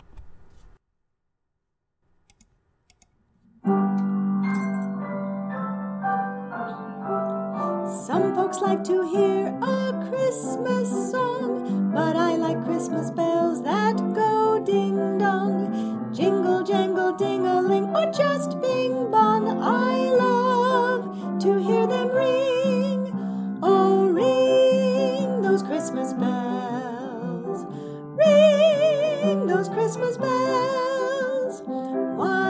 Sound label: with vocals